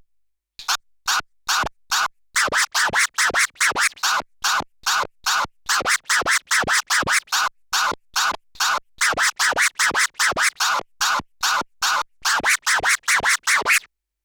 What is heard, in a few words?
Skratch exercise